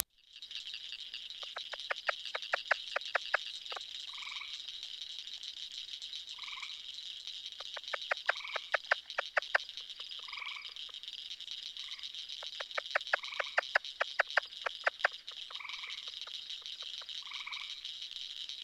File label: Plains Leopard Frog Rana Blairi